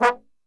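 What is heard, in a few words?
B 1 trombone shot, Brass; Best Service; Brass Super Section; TRMB SHOT; 90 s Sample CDs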